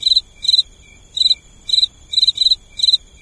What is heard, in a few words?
crickets; There; ThereClient